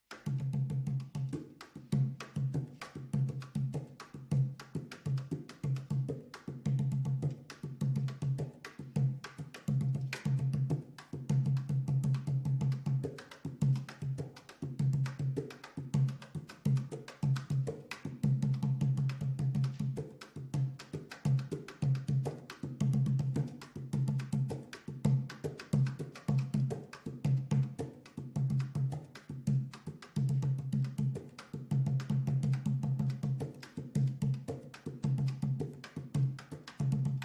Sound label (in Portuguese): rebolo